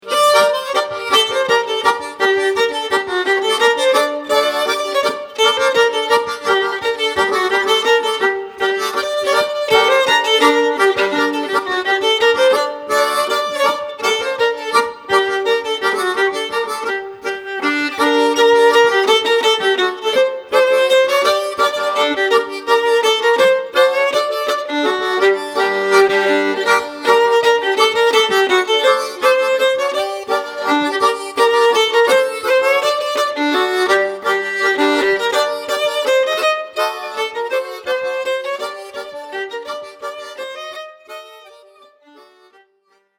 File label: mazurka